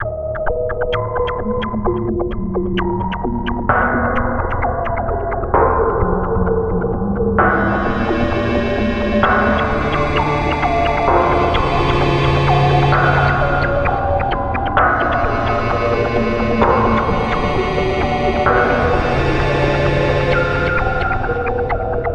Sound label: Boss Intro